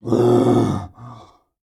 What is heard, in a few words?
Animal Mammal Carnivore Walrus Grunt; animals; AdobeCC